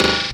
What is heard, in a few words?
Dance Mega Drums, KIT D+B; Best Service